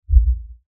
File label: Lfe Swell Low Bass Movement; Swells; Blastwave